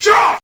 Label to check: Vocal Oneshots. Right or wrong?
Right